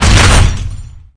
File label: Vehicles Hoverboard